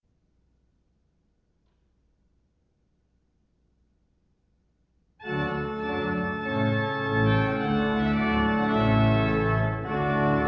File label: Trumpet Tune